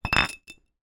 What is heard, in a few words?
glass bottle down on side slight roll concrete ground; foley